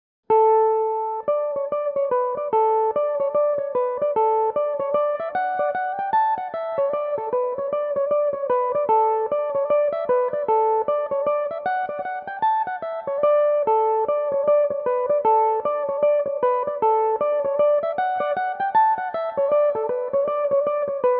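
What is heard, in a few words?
hornpipe d major, mandolin; mitchells hornpipe; belmuse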